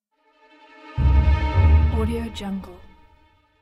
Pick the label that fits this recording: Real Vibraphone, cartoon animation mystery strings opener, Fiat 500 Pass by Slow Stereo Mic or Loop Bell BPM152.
cartoon animation mystery strings opener